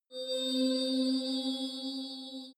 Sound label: alien signal